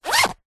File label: foley bag leather small handbag or pouch front compartment pocket zipper; zapsplat